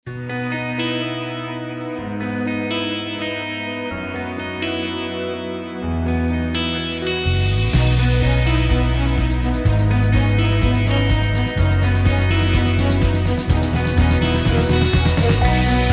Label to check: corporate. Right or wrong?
Right